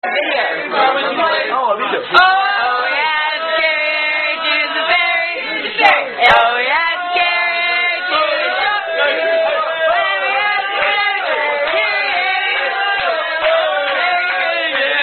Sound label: drinking song; A Spirited Cal Pig